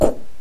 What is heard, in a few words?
block land; magicor